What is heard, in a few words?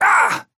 pain small; Trapper